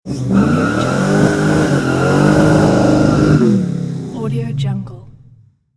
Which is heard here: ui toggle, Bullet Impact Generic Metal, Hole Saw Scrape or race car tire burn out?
race car tire burn out